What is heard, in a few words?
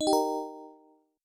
coin